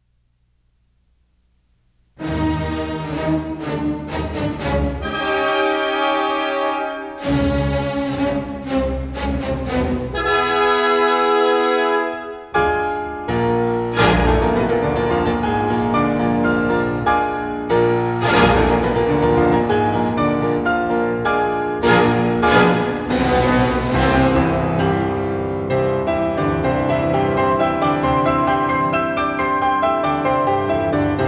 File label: Kontsert dlya fortepiano s orkestrom mi bemol mazhor; Ferents List